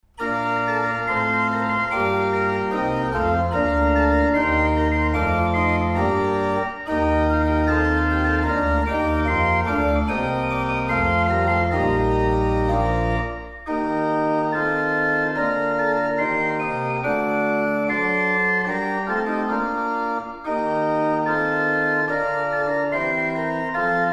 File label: Improvisation